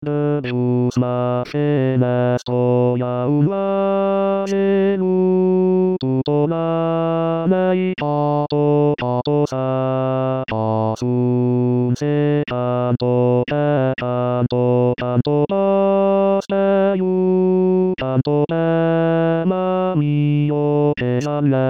Se canto barytons

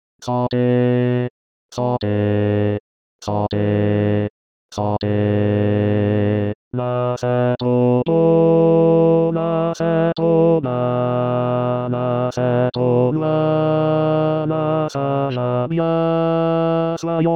basses; Soyons heureux bon apptit

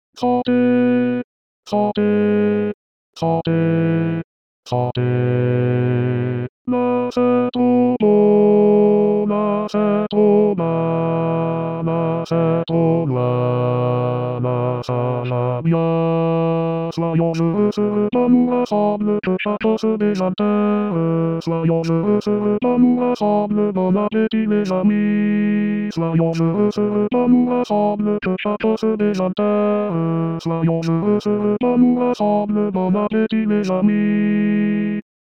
Soyons heureux bon apptit barytons

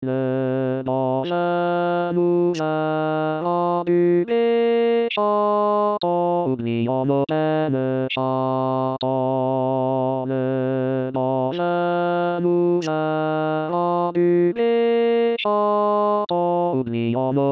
Tourdion barytons

